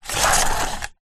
leisure toy car electric race track car manual push on track short